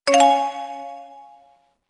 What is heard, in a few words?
New Tip